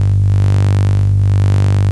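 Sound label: electro hum; hl1mp; doomify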